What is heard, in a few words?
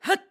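Jump, voice; Link; BOTW; Breath of the Wild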